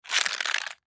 Cell Slide, Cell Telephone Slide Cement Sudden Stop; Slides; Blastwave